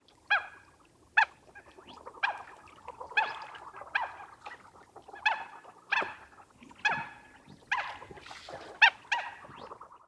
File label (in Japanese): oshidori, birds; さえずり; 全長 45cm（オス） 41cm（メス）